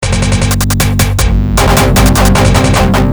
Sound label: depressing rock music